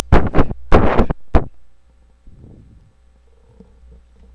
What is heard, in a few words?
whip